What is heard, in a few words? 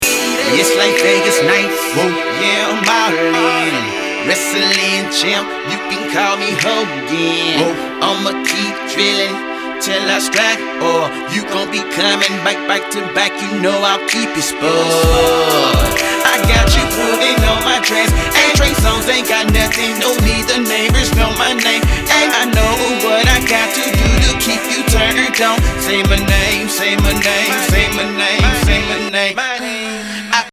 stacked lead with adlibs